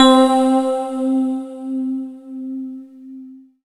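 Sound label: SYN SY-77, Roland, synth digital; Sound & Vision - Gigapack I CD 2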